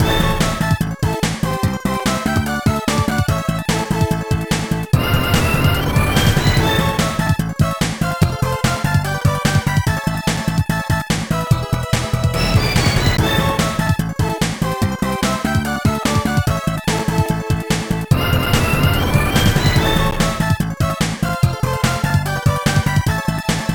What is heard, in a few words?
Character Ending; Dragon Ball Z Shin Butouden; Saturn